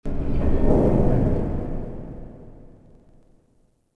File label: Space Junk Bump; Ambient; Sid Meier's Civilization 4 Complete; Beyond the Sword